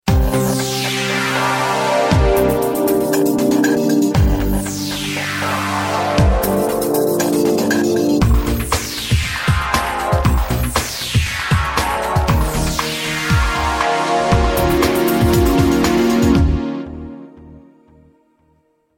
cool electronic instrumental ringtone

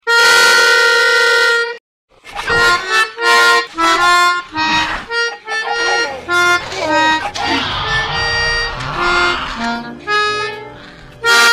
melodica; jurassic-park

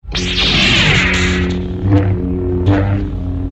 lightsaber

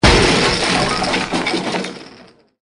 hit sound

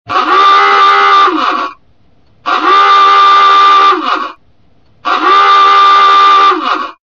awooga sound

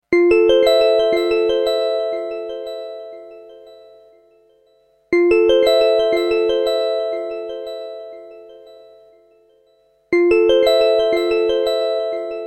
cool bells ringtone

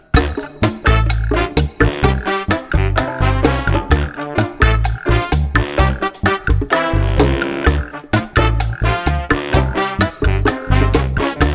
bongo madness ringtone

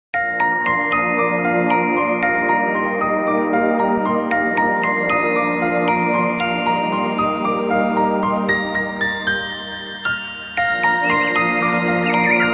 soft music alarm ringtone

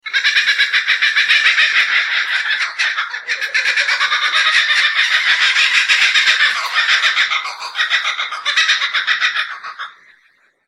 laughing kookaburra

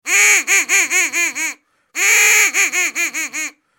duck call ringtone

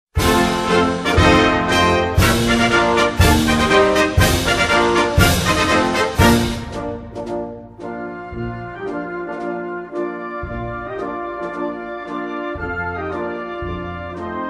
italian national anthem

